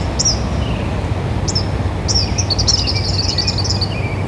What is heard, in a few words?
kingbird, birds